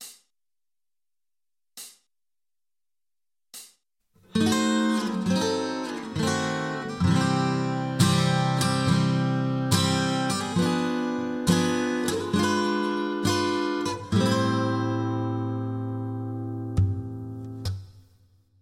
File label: dim, guitar